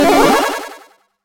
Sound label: flag capture; Platformer